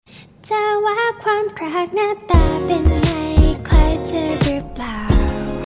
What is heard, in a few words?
Chill Music